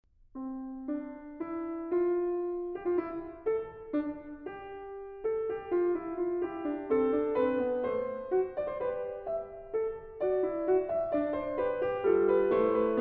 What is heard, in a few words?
Fugue in C, The Well-Tempered Clavier; BWV 846; The Well-Tempered Clavier, Book 1; Angela Hewitt